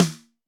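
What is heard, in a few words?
Snr Dry Punch, snare; Alesis D4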